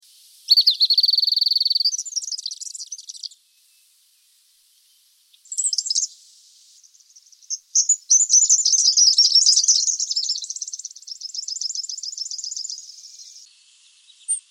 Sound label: malurus cyaneus